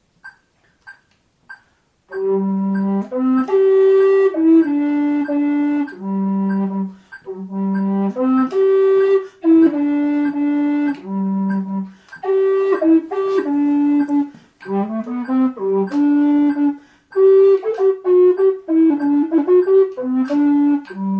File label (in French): Gavotte, FLUTE; caroubel